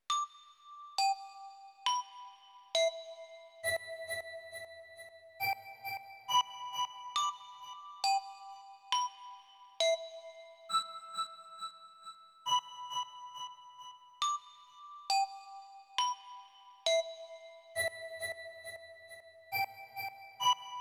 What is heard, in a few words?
ac sub melody